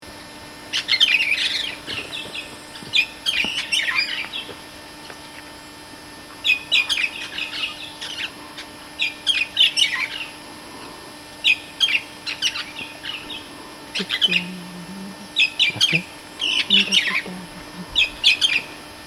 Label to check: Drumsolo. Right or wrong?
Wrong